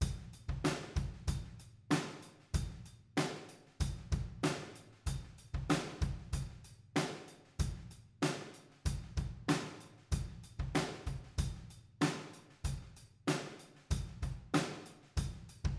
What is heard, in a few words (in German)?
ayotte standard kit raeume; toontrack the warehouse rock sdx